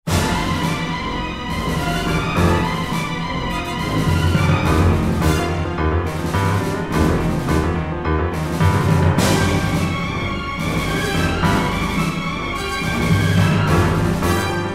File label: Panic; bridgecommander